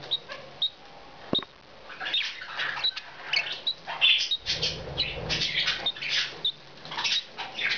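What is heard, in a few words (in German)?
weibchen quietscht, wellensittich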